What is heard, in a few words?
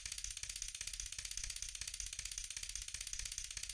reel spin; Recode; rampage riches Desktop